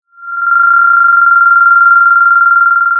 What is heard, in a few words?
Sound of a phone ringing